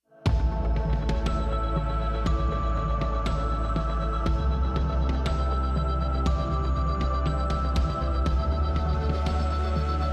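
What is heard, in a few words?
Desert Music, Final Call And Response 3rd Loop 2 Beat Pickup Transposed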